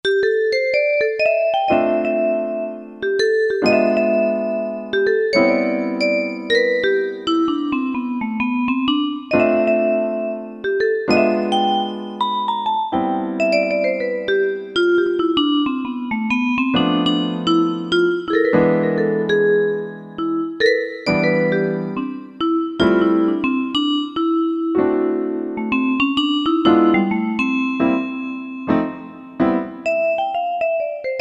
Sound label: PIANO & VIBE, Roland SC-880; Factory Patches